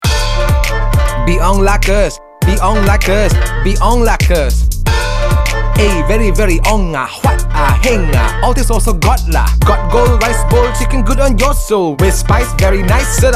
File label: JINGLE, ENG, Hip-hop, Swag, Attitude, Chinese Accent; JINGLE - Ayam Oren The Chicken Rice Shop (ENG) Hip-hop, Swag, Attitude, Chinese Accent